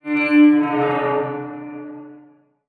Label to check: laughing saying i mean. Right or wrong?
Wrong